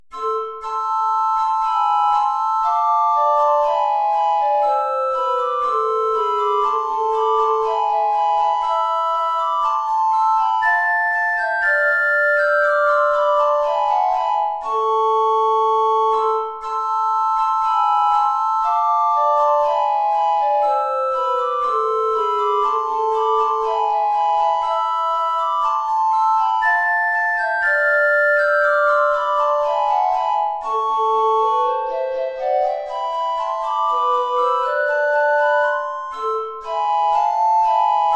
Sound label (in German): 3 Blockflöten SSA hohe Notierung; You Lovers that have Loves Astray